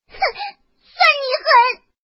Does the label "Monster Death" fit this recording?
Yes